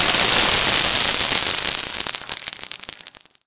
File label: marble fall; FuckThisCollapse